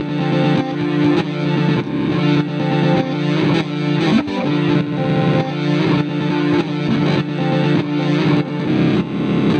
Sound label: Guitar